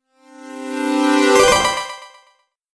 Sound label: Opening Chime